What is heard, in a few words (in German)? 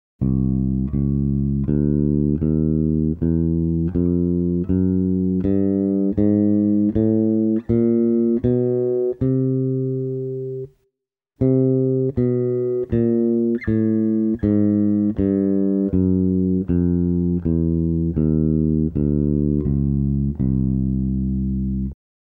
chromatische tonleiter